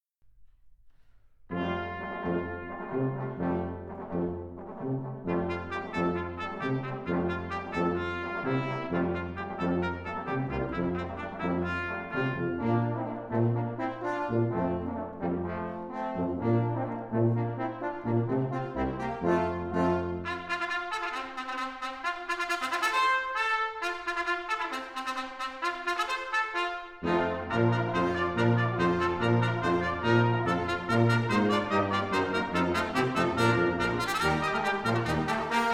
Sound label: Brass Ensemble